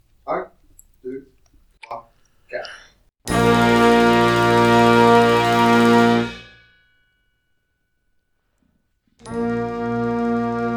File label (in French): vive dans la nuit noire a 80